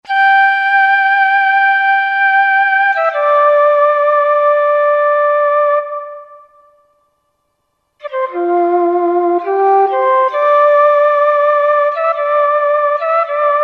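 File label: India Solo Flute; Roland SR-JV80-14 World Collection Asia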